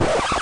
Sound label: scratch; pesterchum